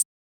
Analog Hi-Hat, Electronic Drum Samples; Hi-Hats; E-Punk Project Drum Samples

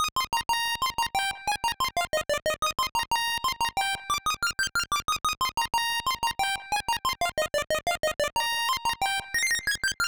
square, 183 bpm; stems; PunkProject

